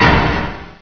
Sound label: gate slam